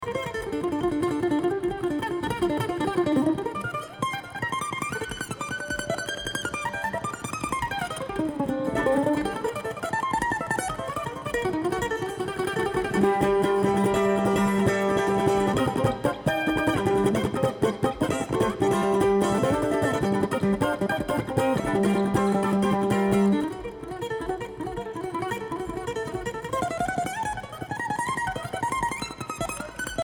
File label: Kentucky Mandolin